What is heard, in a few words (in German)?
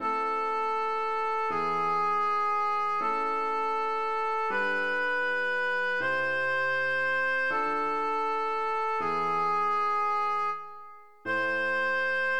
sopran; adoramus